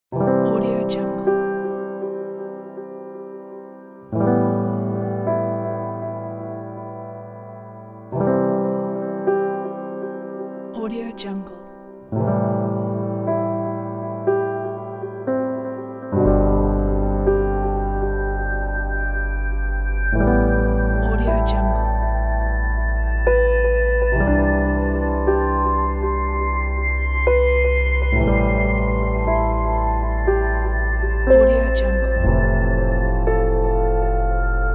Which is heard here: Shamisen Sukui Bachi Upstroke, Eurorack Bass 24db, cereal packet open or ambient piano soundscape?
ambient piano soundscape